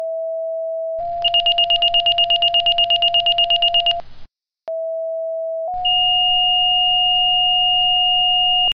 SIREN

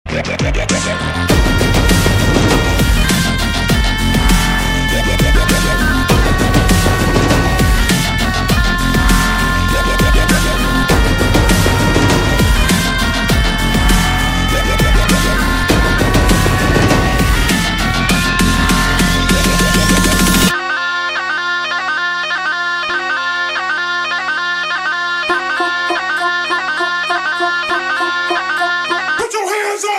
Bolly Dubstep Rmx

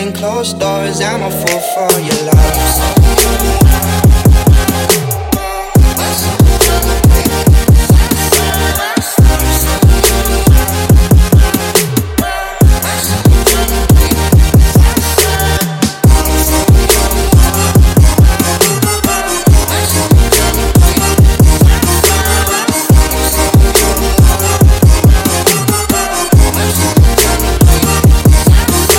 remix trap

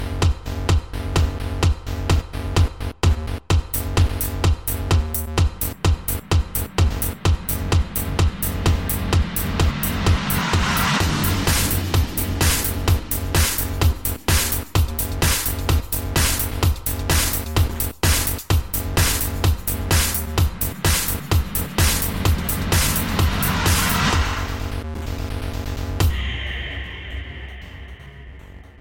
8 Bit Remix

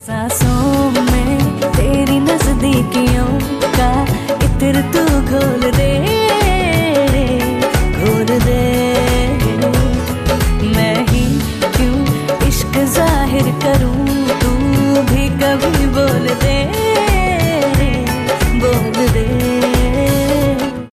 female

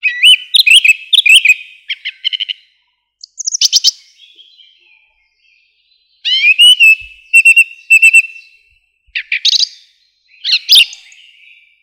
singing thrush; animals

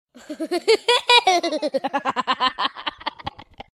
Spooky Laugh

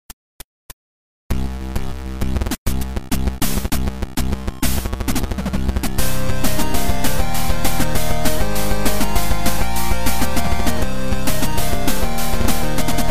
8 bit; Watamote

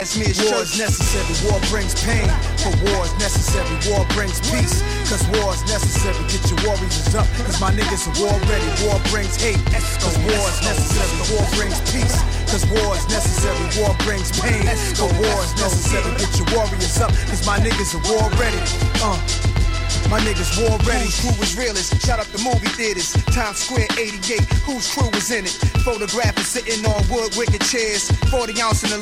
rap; gta

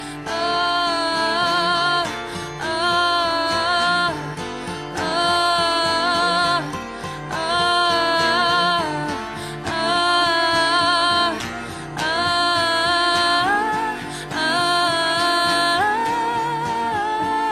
ahhhhh

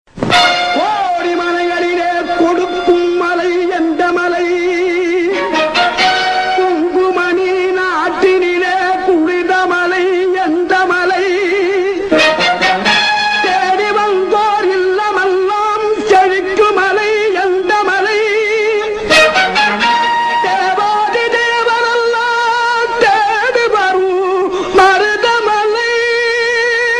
ringtone; electronic

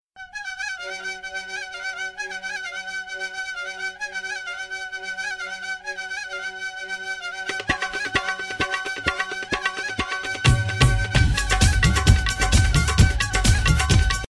punjabi algozey tone; ringtones

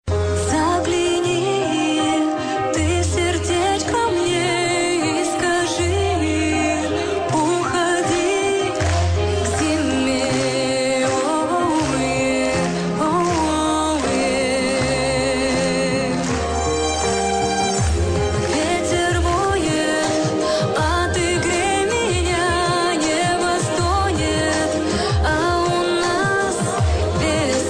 lullaby; scaflowne

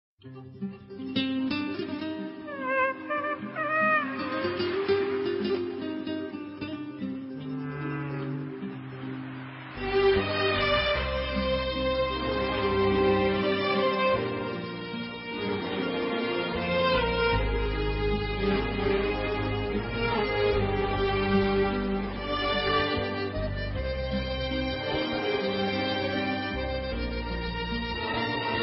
Turkish Soft Music

